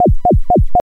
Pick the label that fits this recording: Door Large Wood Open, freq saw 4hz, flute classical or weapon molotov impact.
freq saw 4hz